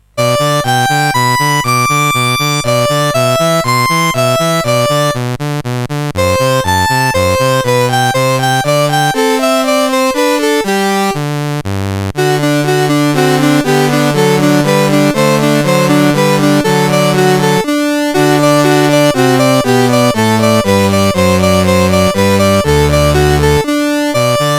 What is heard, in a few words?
Allegro, CSG8580R5